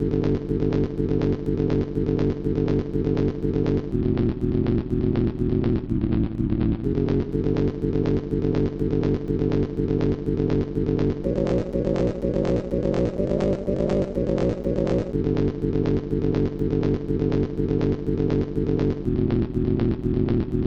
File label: Sinewave